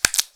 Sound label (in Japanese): 激しめ, ぱちゅ音単発